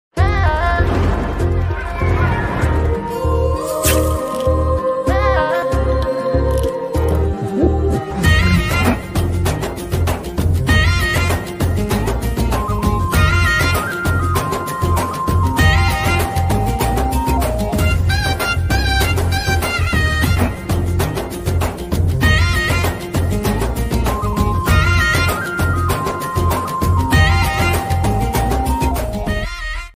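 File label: introduction background music; sridevi soda center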